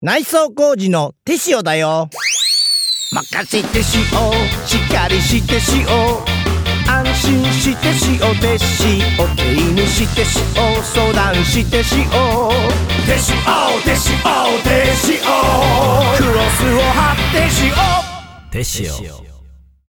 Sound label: bgm